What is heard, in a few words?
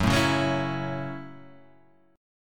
Gb Minor 7th, Gbm7 chord; strummed; JGuitar